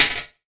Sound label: Sark, One Shots Hit; Sonic Foundry (Sony Creative Software) - Future Retro Dance Excursions